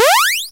revive

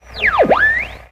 ham radio tuning; minetest

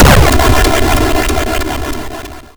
explosion; Stranar

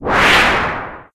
whoosh; minetest

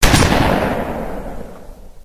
rifle; minetest; rangedweapons

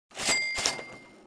cash; minetest; emeraldbank

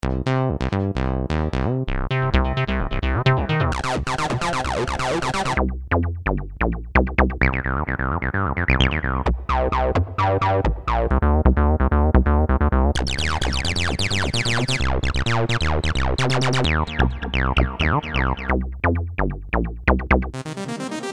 beat; GeoJam2021

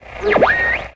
ham radio tuning; minetest

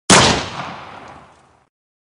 rangedweapons glock; minetest

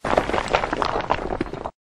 cannons hit; minetest

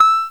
win sound

